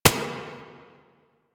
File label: Turret Low Clip; Lasrian APC